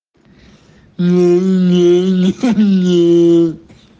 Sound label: brick